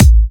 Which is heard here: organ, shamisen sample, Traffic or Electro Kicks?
Electro Kicks